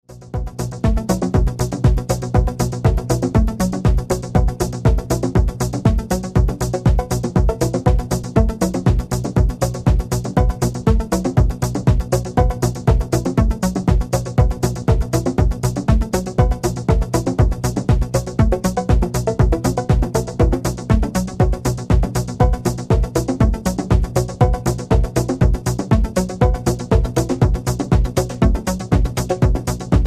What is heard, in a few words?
Drums Drums Drums